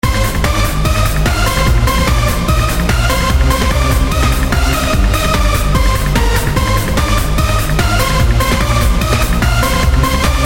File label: Trance